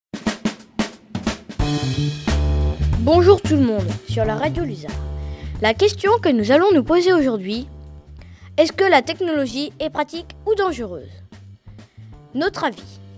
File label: techno